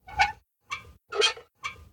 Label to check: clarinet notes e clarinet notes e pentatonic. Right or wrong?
Wrong